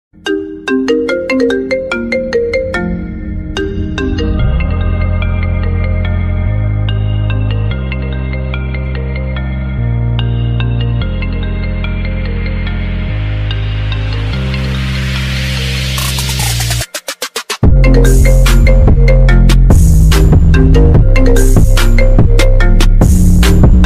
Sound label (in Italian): suoneria iphone